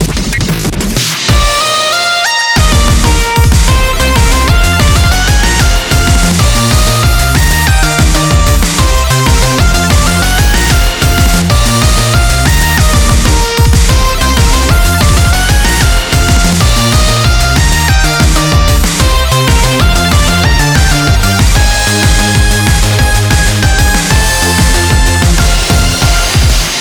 BGM Reach

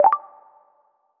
Ingame Count

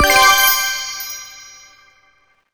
item get

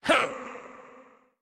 Effort Small

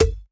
Bounce Rubber

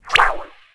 Toss treat

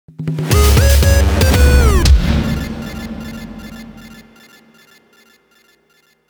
Game Over; Bionic Commando Rearmed; Windows, Xbox 360

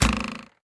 Crossbow Fire

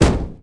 Stumble; subway surfers city sound effects; Android, iOS

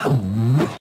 Swing; Joblin Joblifter

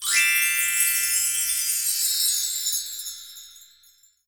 Option Xmas Bell

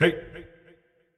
Effort Tiny; Marv

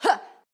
Swipe Normal; Jackie

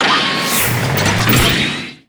Enemy Ufo block Combine

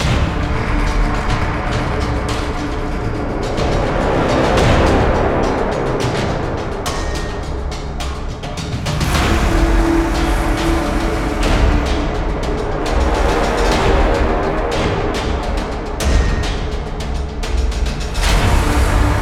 Base Loop low

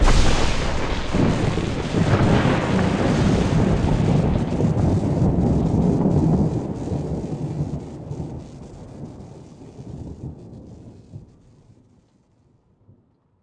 Intro Explosion Stinger; Avie Boss

